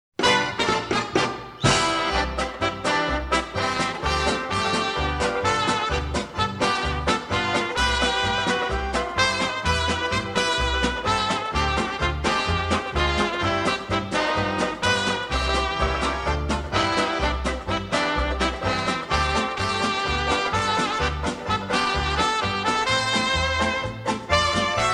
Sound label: Banjo Band